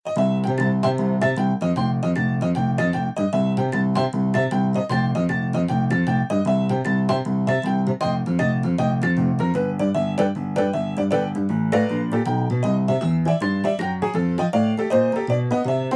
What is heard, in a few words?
Boogie Woogie Woo Detuned